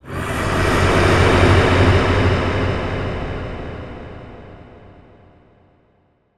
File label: Distant Absctract Scream, Monster Sounds; TheDeclineOfWarriors; Monster Sounds Volume II